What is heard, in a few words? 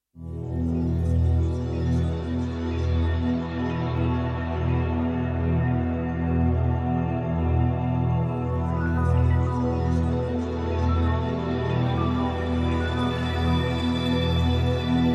Prog-Rock, Progressive Rock, Art-rock, Ambient